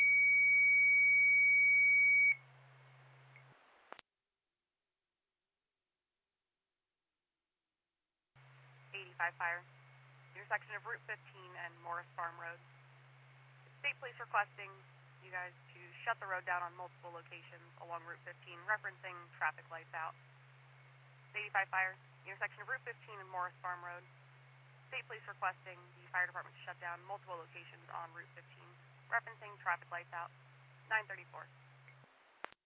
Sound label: Siren; Lafayette FD